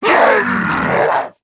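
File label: die, zombie male; nmr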